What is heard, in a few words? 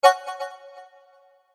Digital Chime Signal Vox Click; Digital Clicks, Blips & Alerts